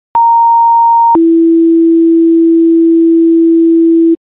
page, tones

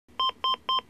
tone e, lifepak12

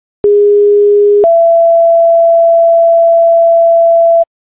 siren, tones

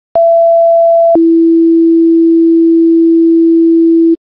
page, tones

